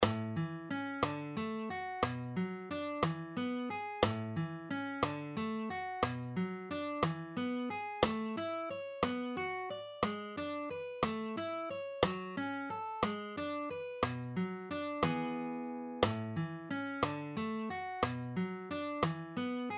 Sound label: String Skipping Exercises Half Speed, guitar